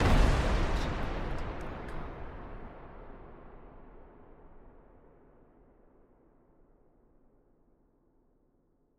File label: Explosion Blast; SoundsCrate